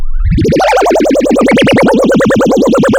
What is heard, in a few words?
pop, Sound of a mud pump